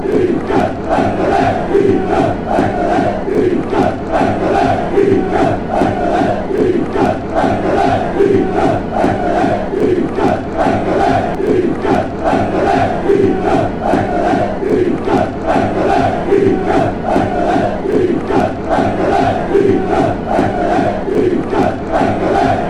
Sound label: coimbra chant